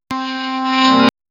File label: jump sound; mario